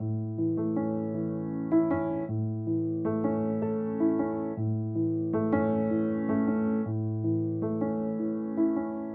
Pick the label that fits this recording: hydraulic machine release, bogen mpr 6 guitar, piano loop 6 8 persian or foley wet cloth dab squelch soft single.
piano loop 6 8 persian